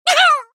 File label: baby murloc death